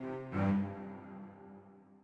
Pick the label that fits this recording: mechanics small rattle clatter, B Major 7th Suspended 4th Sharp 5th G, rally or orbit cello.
orbit cello